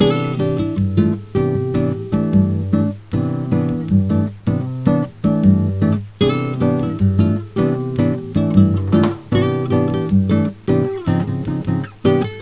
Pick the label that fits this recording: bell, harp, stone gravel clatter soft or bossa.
bossa